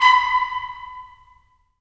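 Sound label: brass trumpets staccato; Roland LA Composer vol. 4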